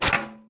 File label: handle up; World Of Goo